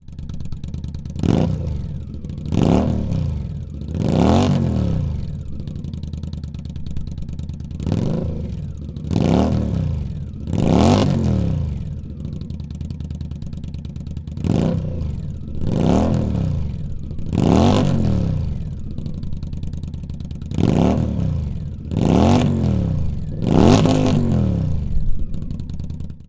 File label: Touring 111 4 Slip-On Rev; Indian